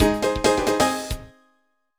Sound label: Jingle Star; Super Mario 64 DS; NDS